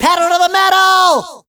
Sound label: Pedal; Nicktoons Nitro; arcade